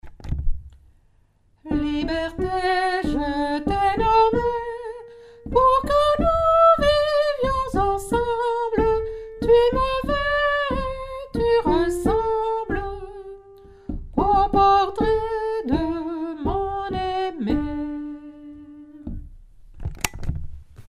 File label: soprano